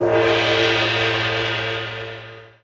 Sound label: Gong